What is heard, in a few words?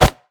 great wraith basic attack; LoL; monsters